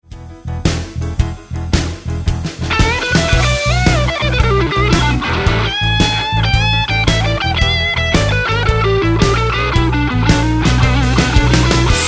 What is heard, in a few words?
Roadhouse Licks Lick, guitar; 20 Roadhouse Licks